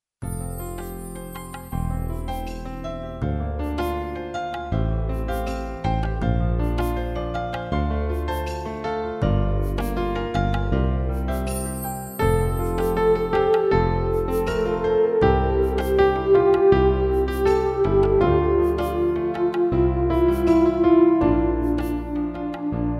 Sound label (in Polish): Kolysanka dla Jezunia wersja instrumentalna